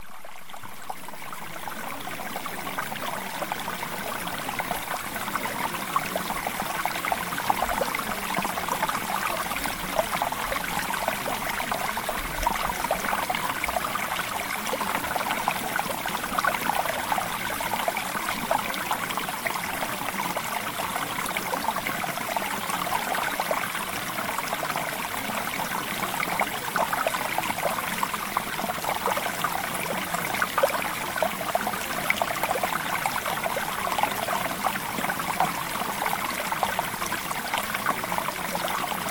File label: Mountain Stream